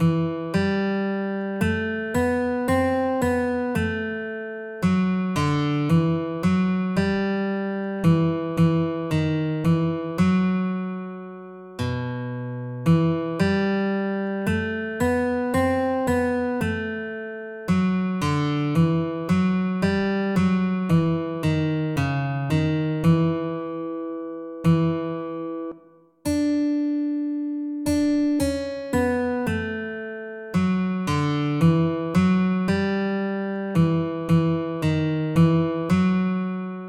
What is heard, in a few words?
christmas tablature melody, guitar; what child is this